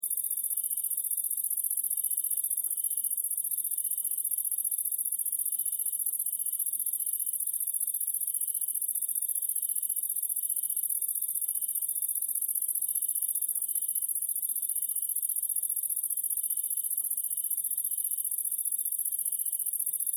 som de gafanhoto

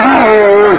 som de urso

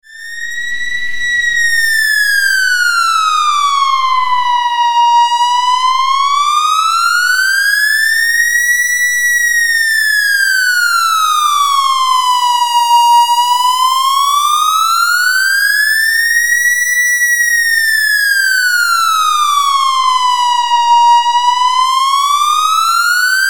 sirene de policia efeito sonoro

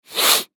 soando o nariz efeito sonoro

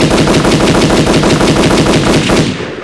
barulho de arma de fogo

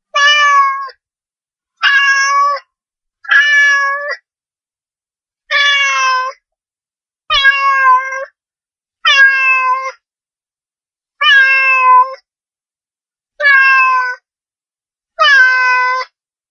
gato chorando alto

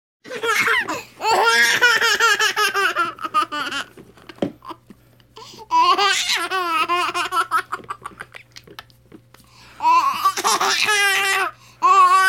bebê caindo na risada

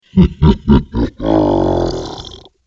monstro rindo; efeito sonoro

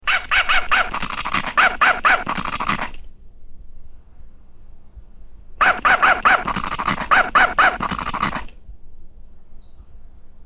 cachorro de brinquedo latindo

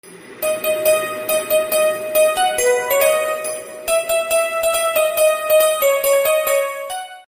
toque de musica natalina

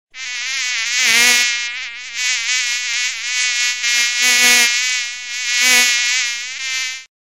Mosca irritante

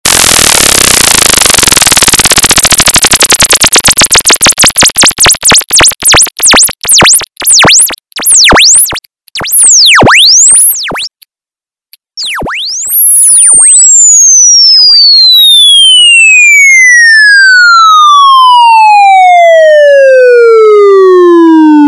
irritante electro; toque irritante eletro

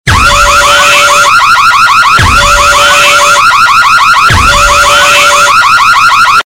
alarme disparado de carro alerta

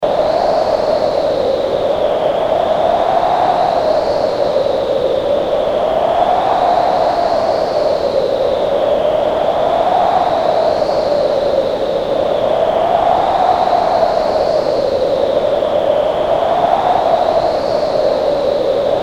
ventos fortes efeito sonoro

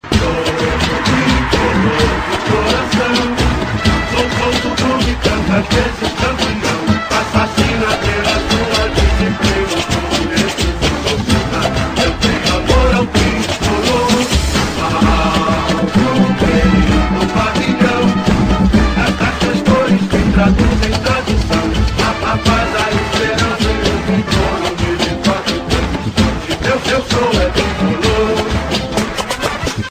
funk; hino do Fluminense; versao funk

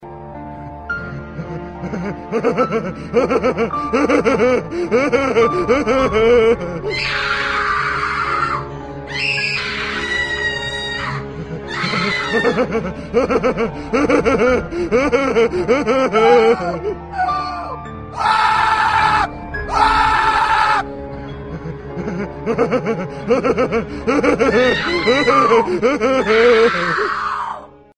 risada e gritos, sons de risadas e gritos para celular

